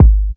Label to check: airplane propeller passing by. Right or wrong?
Wrong